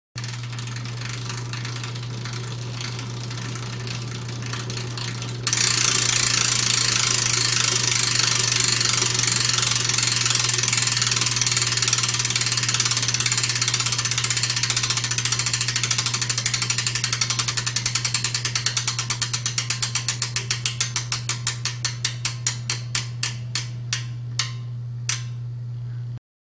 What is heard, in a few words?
Bicycle coasting sound effect